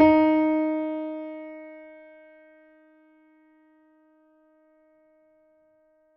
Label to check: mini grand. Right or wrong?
Right